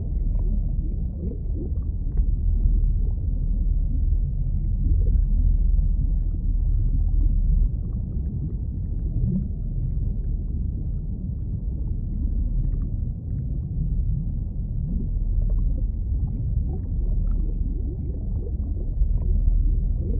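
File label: ambience seaworld loop; Blind Drive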